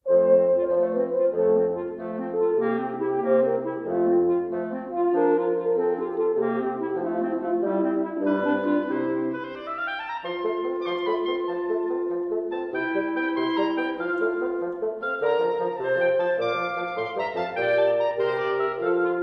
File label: Quintet; Mvmt IV